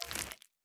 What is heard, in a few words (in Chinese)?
沼骸环境声; ambient